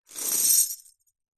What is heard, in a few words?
foley keys large bunch prison guard pick up from carpet